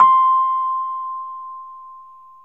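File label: Rhodes, C5, right; 3D Sound; 3D Keys VOL-1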